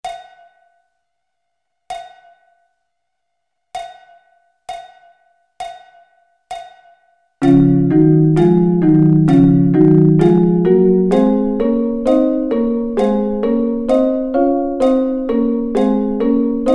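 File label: D flat Double Stops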